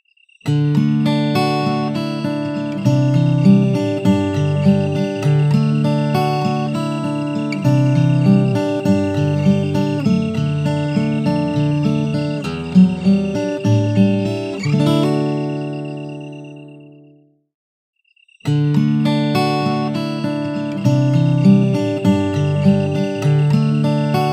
Ukulele Ringtone

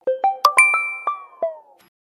OnePlus 12 Notification

